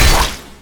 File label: attack; tidehunter